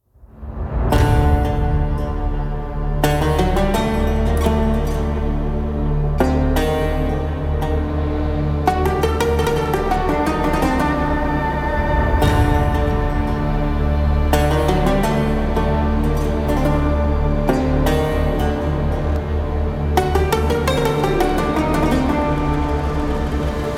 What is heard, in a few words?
middle east arabic muslim islam music